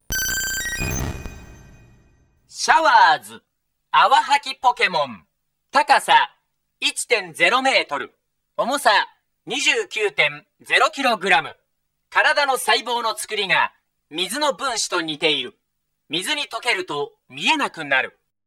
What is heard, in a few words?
vaporeaon, Pokemon Gameboy Sound; Pokemon Gameboy Sound Collection